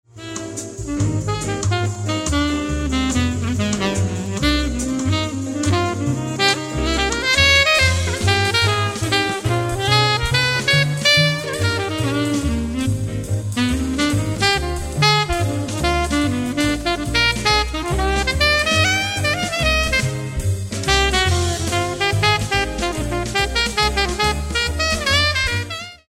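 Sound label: Alto, sax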